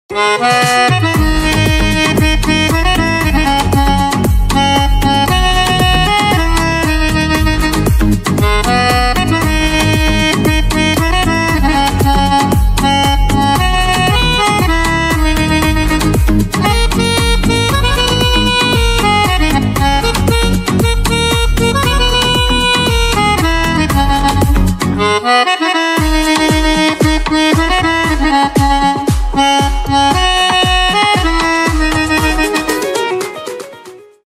Pianica

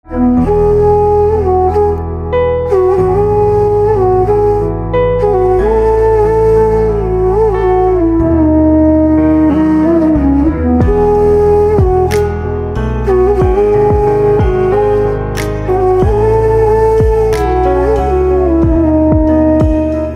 Flute, instrumental ringtones

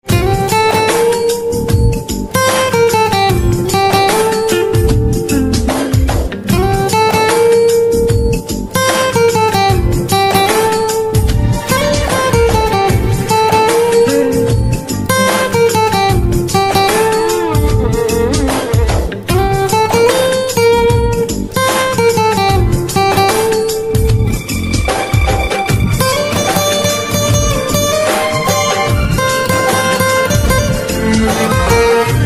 Guitar

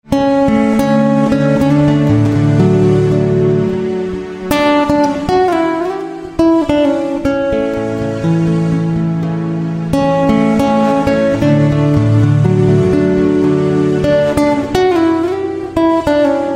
Guitar Silent

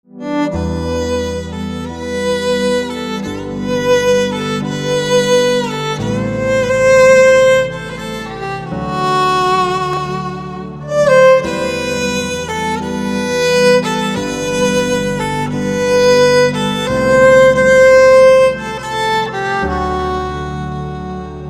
Violin

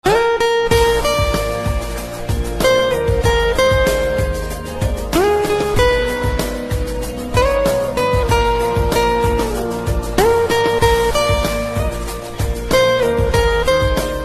Guitar

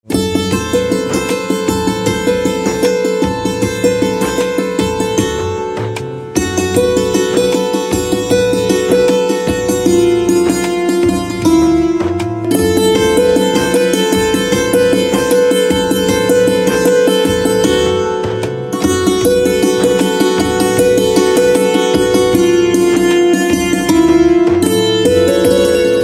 Santoor

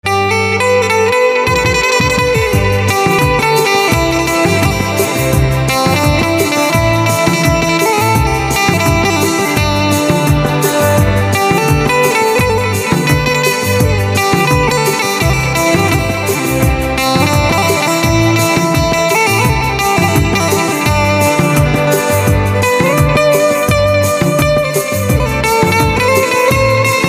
Banjo

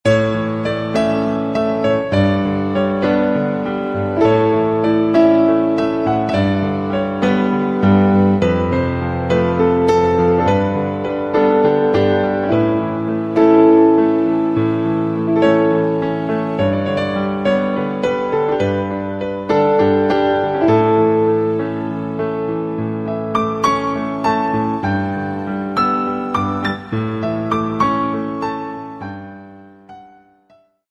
Piano

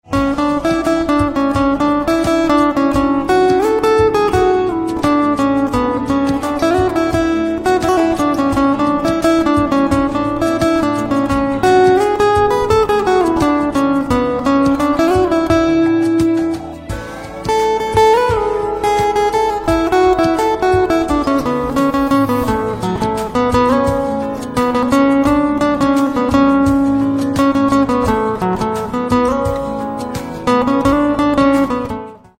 Guitar, instrumental ringtone

